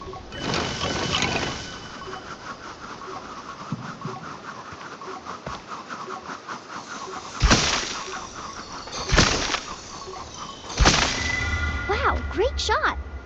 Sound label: shoot; kena bridge of spirits